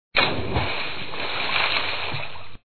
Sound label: splash; butterfly island adventure